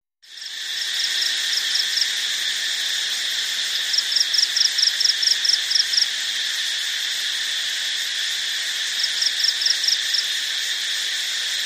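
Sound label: Heavy Continuous Cricket, crickets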